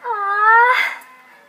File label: ah girl disappoint; Voice; ooh ahh